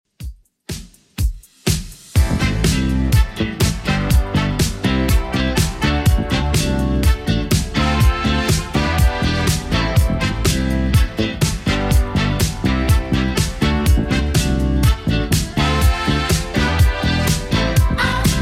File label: 80s Redrum 123bpm